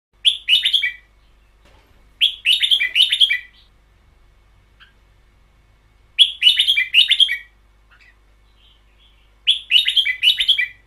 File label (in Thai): เสียงนกกรง เบิ้ลยาว